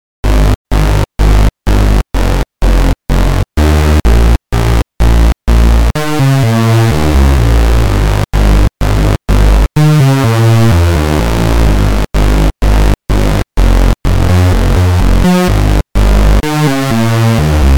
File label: Paris SAW